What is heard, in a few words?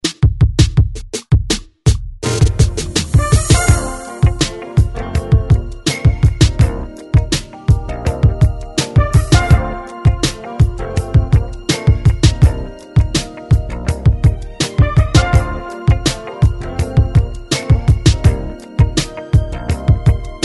90s ReDrum 82 Bpm; DJDOLLS